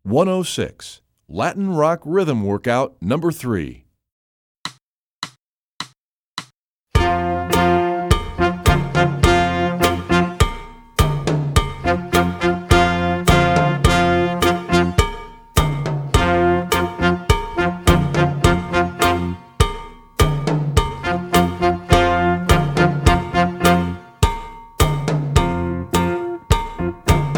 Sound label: latin rock rhythm workout